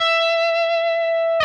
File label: Left-hand vibrato